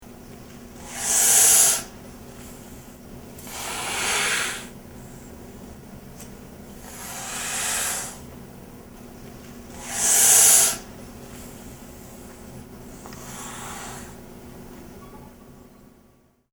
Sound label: northern pinesnake hissing